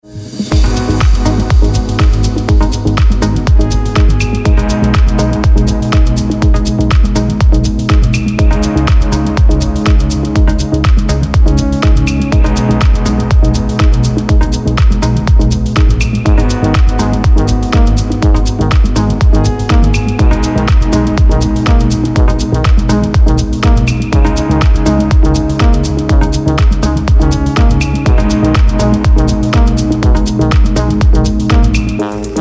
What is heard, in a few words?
TECHNO